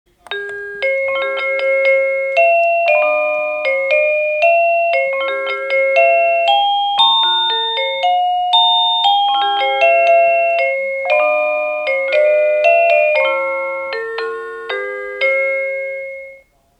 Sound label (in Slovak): Ukážka melódie zvonenia zvončeka